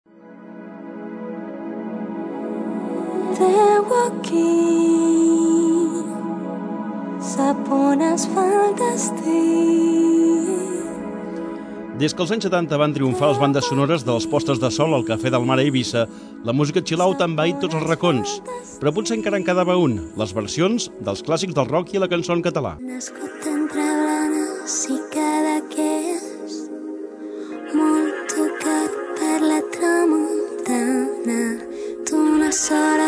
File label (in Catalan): rock catala chill